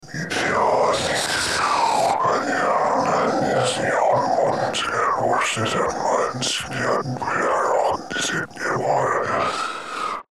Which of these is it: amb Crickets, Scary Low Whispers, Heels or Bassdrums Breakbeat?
Scary Low Whispers